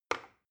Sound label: plastic button start release regular